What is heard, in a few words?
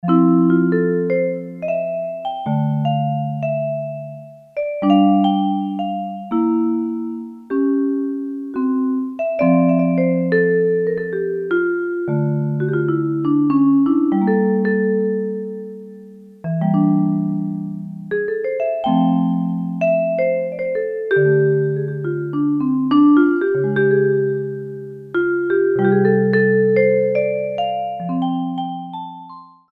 VIBES, Korg DSS-1